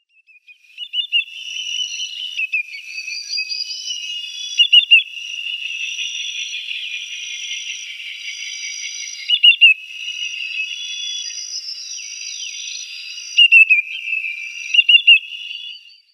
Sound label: Greater Yellowlegs calls